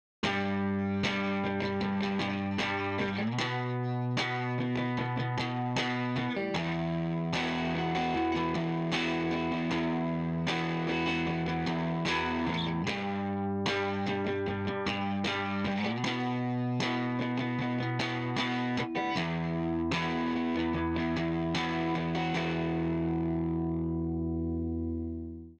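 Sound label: original rhythm guitar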